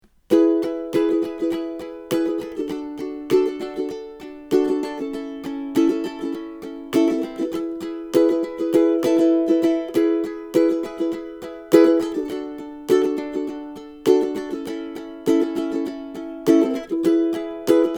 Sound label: Strum, ukulele